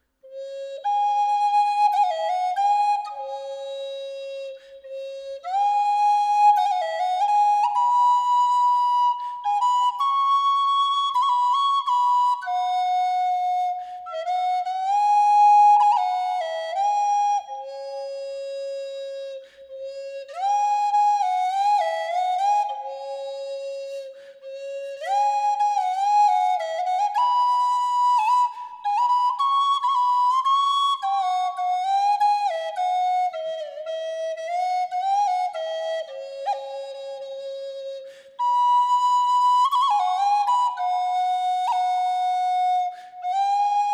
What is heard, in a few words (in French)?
pipeau do432